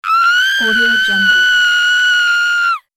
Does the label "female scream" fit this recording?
Yes